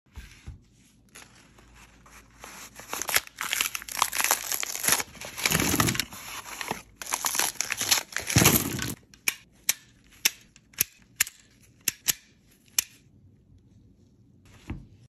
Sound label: Filling magazine; پر کردن خشاب